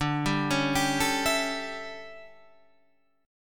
D Major 7th Suspended 2nd Suspended 4th Guitar Half Step Down, chord